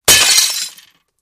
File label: Glass Break Single Gl; windows & mirrors